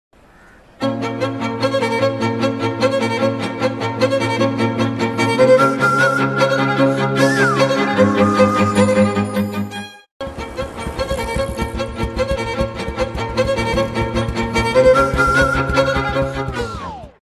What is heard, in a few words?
romantic theme ringtone; bhairava movies; south hindi dubbed movies